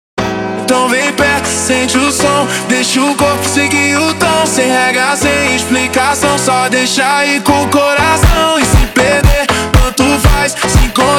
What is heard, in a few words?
Montagem slowed